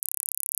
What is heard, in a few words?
Gauss Filt C#1